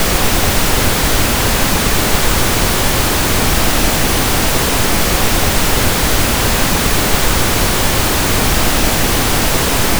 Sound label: Pink Noise 2x mono (0dBFS peak)(-15dB rms)(CF 15dB); dimensionner ampli